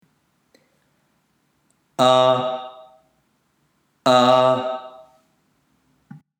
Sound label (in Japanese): 大きな地声